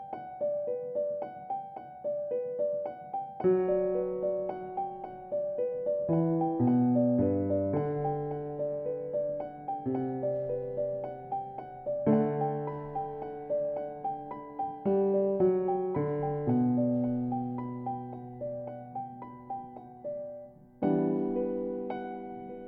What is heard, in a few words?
Winter Tone